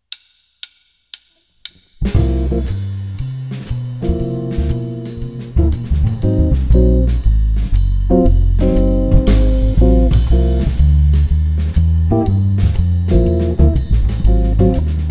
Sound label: Vamp